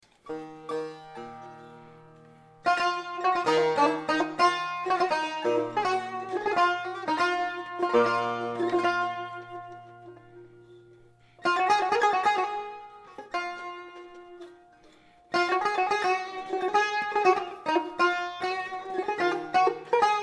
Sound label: persian tar audio sample